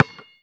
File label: basketball dribble; Modern Warfare